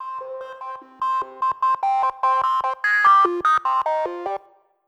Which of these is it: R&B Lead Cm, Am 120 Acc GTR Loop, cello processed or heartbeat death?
R&B Lead Cm